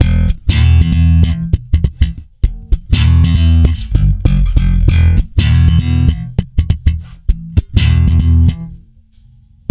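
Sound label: E, funk swing, bass; Spectrasonics; Bass Legends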